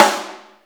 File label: subtle reverb snare single hit g sharp key; Mono